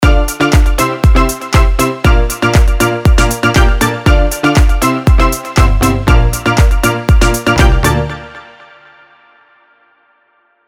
Avenger Tropical Deep House

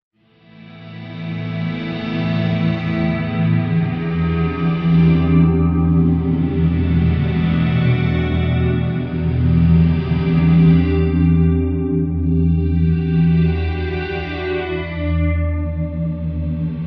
Eventide Ultratap Delay

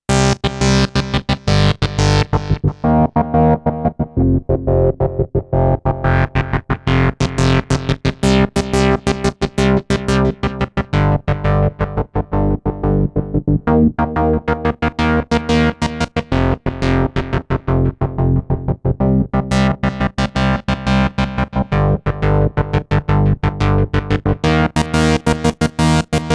OB 6 sound tweaking; Gucland

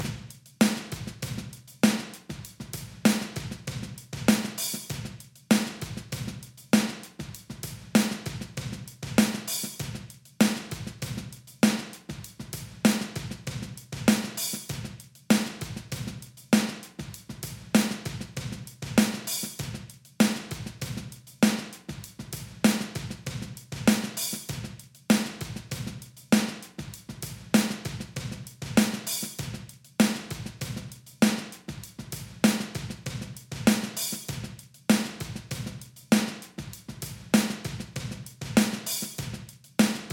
Channel Drums Touch Sustain Boost; Flux Evo

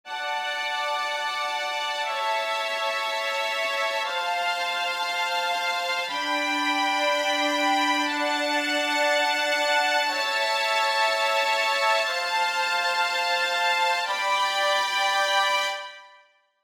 Strings; Logic Pro X

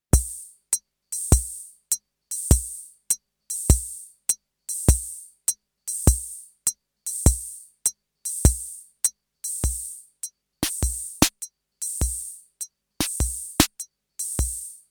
Roland CR-78 Swing and Shuffle

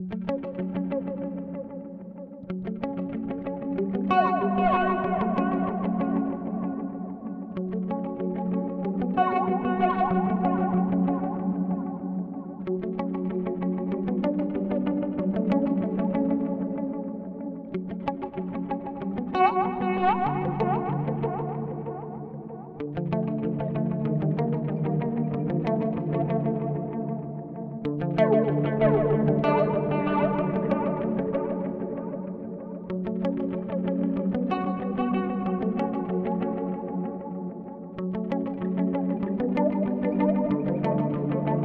Delay Reverb Modulation; UAFX

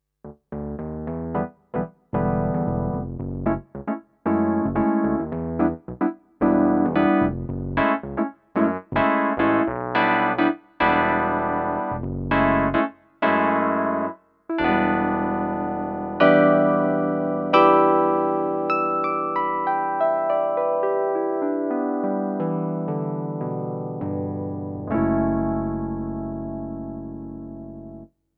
E Piano

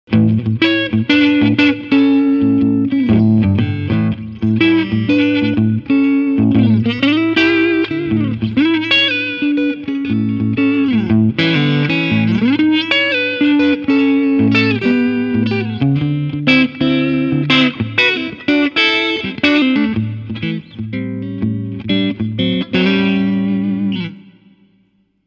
Egnater

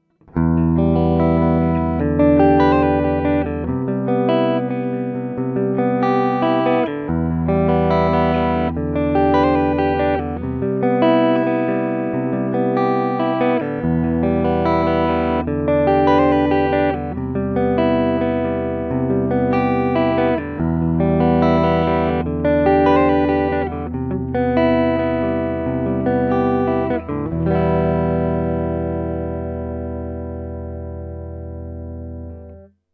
Chorus

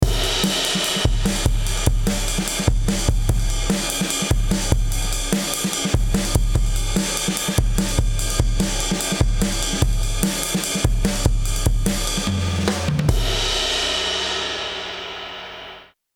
Chandler TG 1 Drums THD Lim

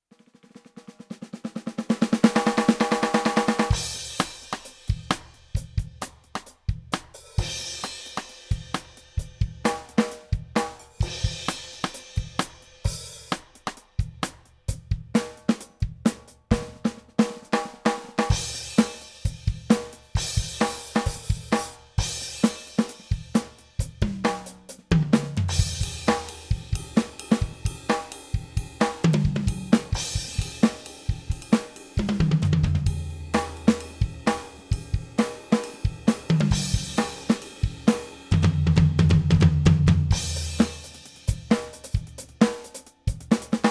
PEARL eMERGE; First Call

